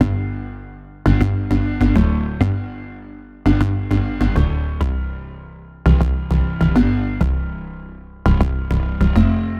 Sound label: Gmaj Bass Chords, 100; Zero-G; Future Wave